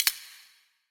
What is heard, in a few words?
Kitchen Foley FX Drums